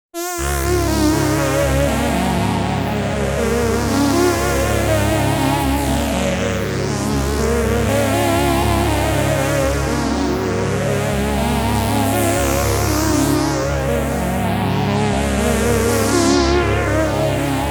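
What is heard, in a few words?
Sizzling Stringer, synthesizer; Sequential Pro 3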